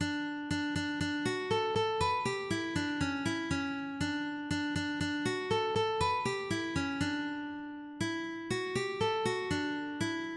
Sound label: early, ukulele